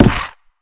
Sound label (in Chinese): 嘎枝之心击打声